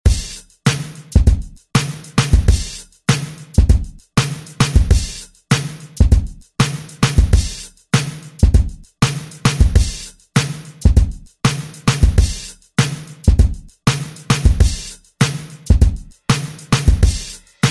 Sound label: 90s ReDrum; DJ Mhark